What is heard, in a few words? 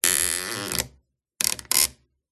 Stress Creak Squeak Groan; PMSFX